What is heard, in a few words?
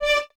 Violins D 5; Miroslav Vitous; String Ensembles; Detache